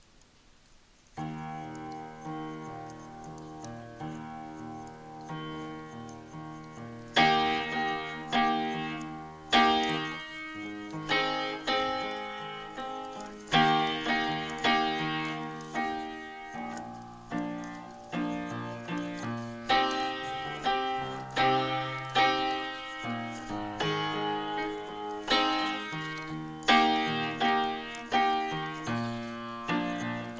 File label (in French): Composition piano